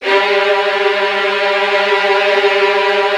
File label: VN TRILLS, Virtuoso Strings